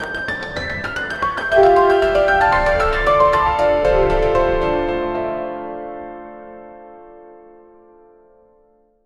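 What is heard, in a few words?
cinematic orchestral piano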